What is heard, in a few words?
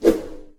megaswosh; qubodup; Zombies Layer Viking